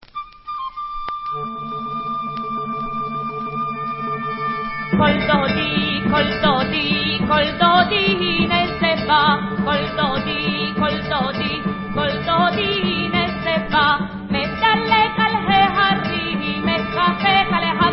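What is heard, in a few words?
Vocal, Israeli Dances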